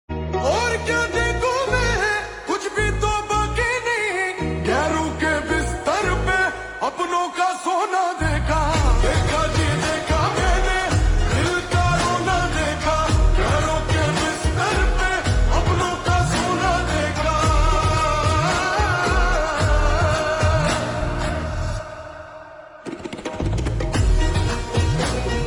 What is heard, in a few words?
Slowed Reverb Ringtone